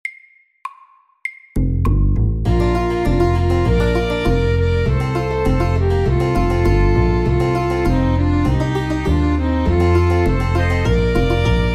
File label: Country and western FORT